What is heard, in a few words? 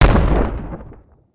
Explosion